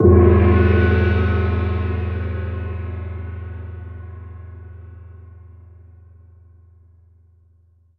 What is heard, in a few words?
gong; gong from the skytemple dragon easter egg